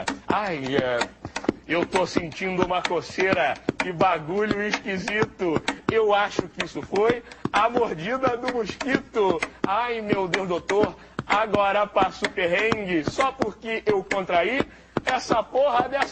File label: rap